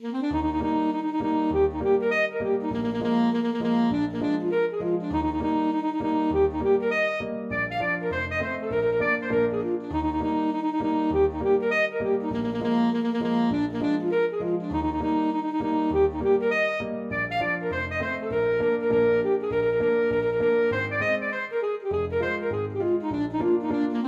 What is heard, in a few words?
hornpipe, saxophone; trumpet